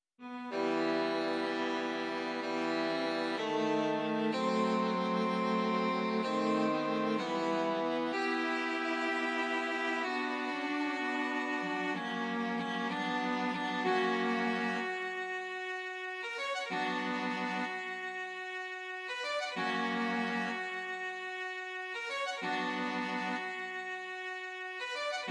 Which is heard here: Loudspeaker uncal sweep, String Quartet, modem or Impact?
String Quartet